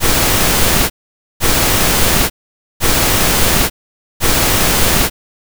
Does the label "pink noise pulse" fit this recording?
Yes